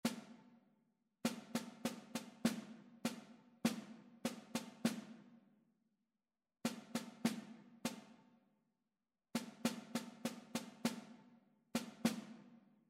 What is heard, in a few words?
rhythmic error detection; ear training